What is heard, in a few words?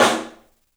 STEEL, Drums, ROOM SINGLE; 3D Sound